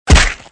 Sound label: hard punch; Electricman 2